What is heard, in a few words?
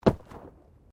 Body movement Organic Impact Medium